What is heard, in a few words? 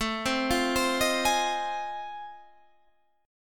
F 7th Sharp 9th